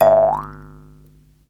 JEWS HARP; Jew's Harp & Spoons

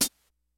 HATS CYMBALS; DRUMS; Kid Nepro; Elite

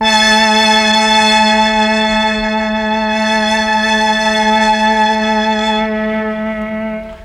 MEL STR, Legendary M400

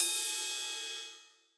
Ride, Belgium Beats 132bpm; Big Fish Audio - Old Skool House; OSH Construction Kits

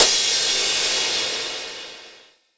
Crash, Cymbals; Vengeance; Essential Clubsounds Vol.4

